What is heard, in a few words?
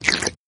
slurp